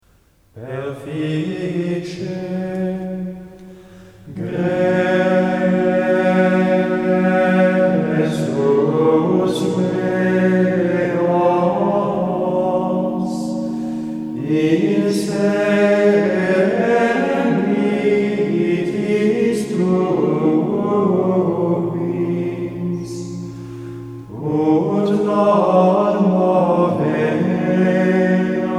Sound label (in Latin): Offertory Perfice gressus meos; Propers; 6th Sunday After Pentecost